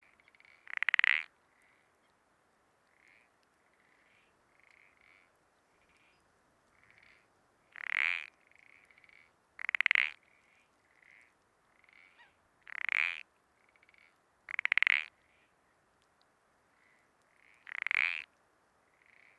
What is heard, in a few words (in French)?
pelodyte ponctue